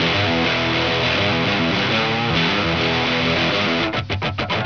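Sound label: Distorted Riff Guitar; Sonic Foundry (Sony Creative Software); Crimson Blue and Fabulous Horncraft 4 RnB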